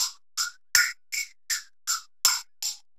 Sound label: TAMB; Zero-G; Chemical Beats